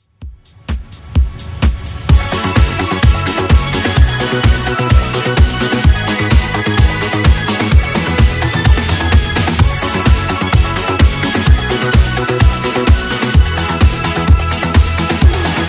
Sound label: 80s Redrum 128bpm